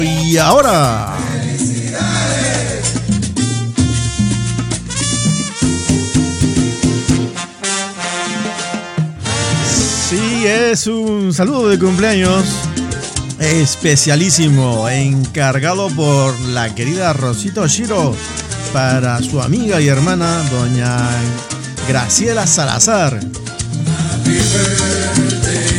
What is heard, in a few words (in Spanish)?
Feliz cumpleaños